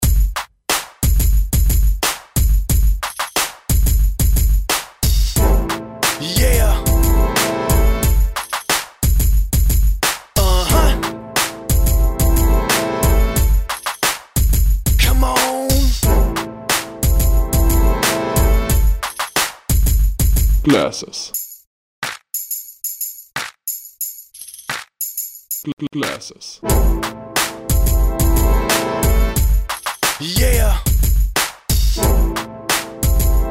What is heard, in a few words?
glasses rap